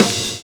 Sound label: CRASH CRO; Univers Sons - Kitch 70s